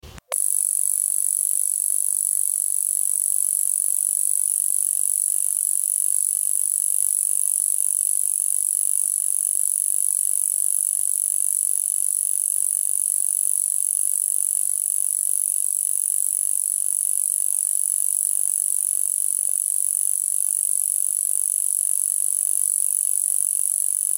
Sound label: Gampsocleis glabra lab recording 29°C high pass filter